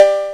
BELL; USB Soundscan vol.27; Ragga & Dub Reggae; AKAI; BELLS KIT

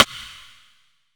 Rim; Zero-G - Future Wave; Drums; Xtra

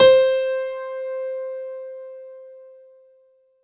C4 DANCE, ANALOG SEQ, Roland JV 2080

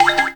Rainfall Droplet; FX; M8

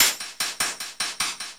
Chuff Echo, Synthetic Pulses

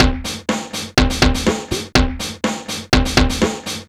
Beat, Drums & Percussion; Electrocution; Beats

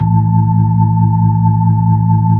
B-3, Brite

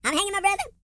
E TALK, Vocal Planet R&B & Dance

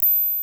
PER, TECHNO PERCS; Crypton - DrumBase5000

